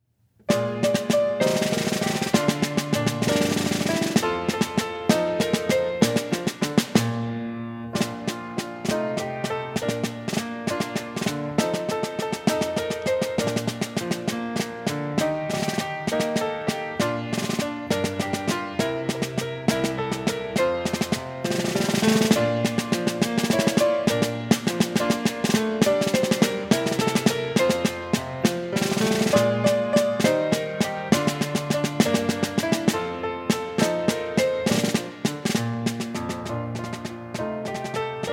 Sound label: Snare Drum